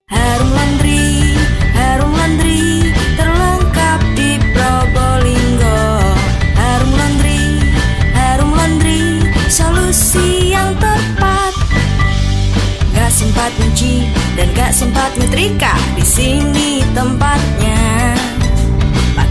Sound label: Jingle; Harum Laundry Probolinggo